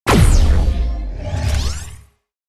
shoot; pixel gun 3d; hypno pursuer

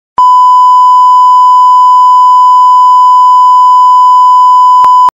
bipper

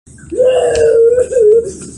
crying; game boy

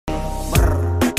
growl

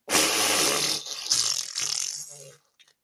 fart

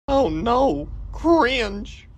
cringe; smg4

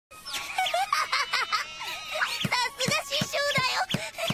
laughing; melodytchi

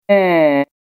mummy sound

